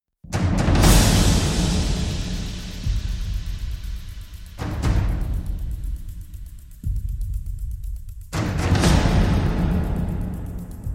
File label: perc only